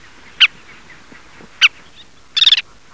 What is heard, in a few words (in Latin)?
Glareola pratincola; pticy; kulik